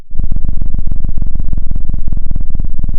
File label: pop, Sound of a cat purring